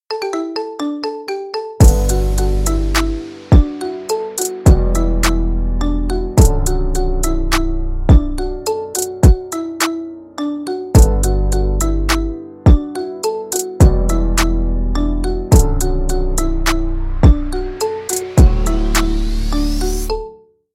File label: marimba remix